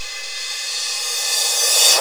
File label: REV Crash, Roland JV-2080